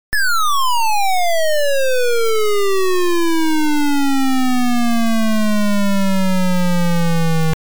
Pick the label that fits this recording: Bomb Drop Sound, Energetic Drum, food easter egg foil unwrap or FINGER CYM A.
Bomb Drop Sound